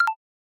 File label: graphics digital click; soundscrate